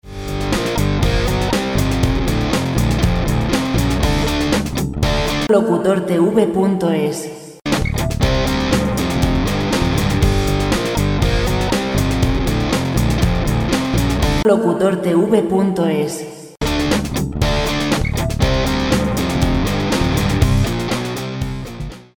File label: 120 rock; Música rock sin derechos